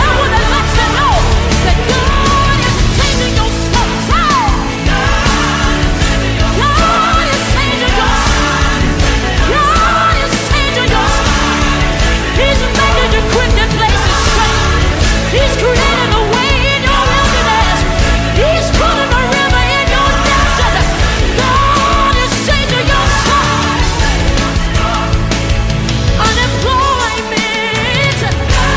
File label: Ringtone